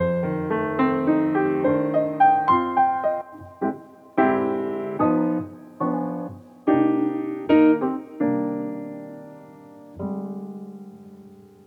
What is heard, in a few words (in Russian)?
Fortepiannaya Muzyka